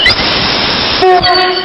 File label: horn, vehicle